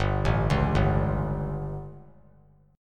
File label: G Suspended 2nd Flat 5th G, chord; Gsus2b5 arpeggiated; JGuitar